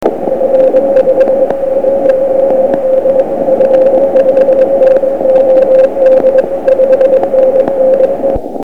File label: cw